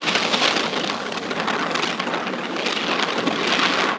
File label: rain